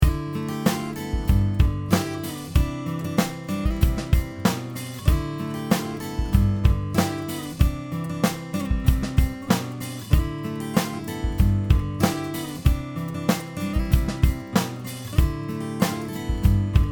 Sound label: AKG C251